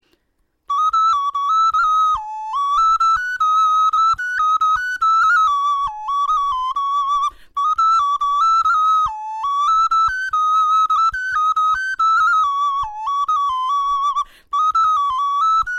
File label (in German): melodie, knochenfloeten